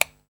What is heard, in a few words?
Switch